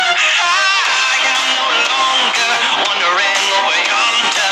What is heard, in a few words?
Notification sound